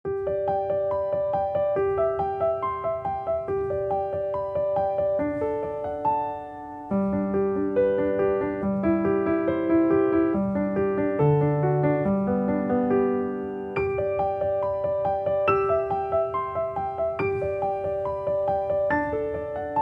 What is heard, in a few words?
Romepiano